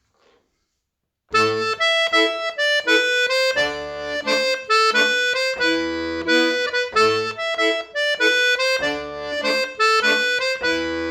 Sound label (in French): Gavotte Ton Simple